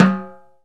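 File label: lo timbale hand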